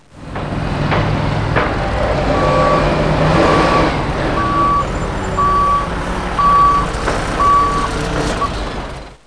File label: backhoe, constru; KABOOM! - Wild and Wacky Sound Software